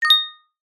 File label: multimedia notification bell glassy chime